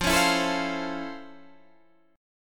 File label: F Major 7th Flat 6th, F, guitar